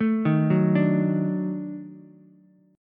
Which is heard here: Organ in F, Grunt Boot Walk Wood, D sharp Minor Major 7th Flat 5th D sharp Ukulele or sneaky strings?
D sharp Minor Major 7th Flat 5th D sharp Ukulele